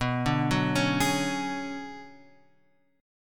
B Minor 6th Add 9th B; Bm6add9 Chord {7 5 4 6 X 4} arpeggiated

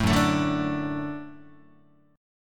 F sharp Minor Sharp 5th F sharp Guitar; strummed; JGuitar

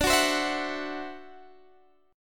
D 9th Guitar Standard, chord; strummed; JGuitar

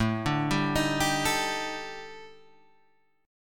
D sharp Major 7th Flat 5th A, chord

